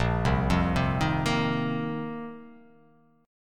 A sharp Suspended 2nd Suspended 4th A sharp

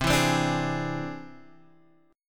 E Augmented 7th C, guitar

